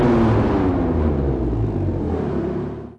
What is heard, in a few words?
throttle off; TDMCarpack; TDMCars